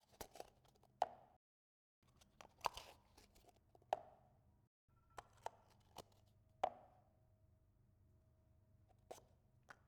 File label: Cardboard Cup Place On Table